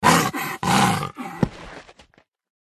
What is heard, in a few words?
wolf death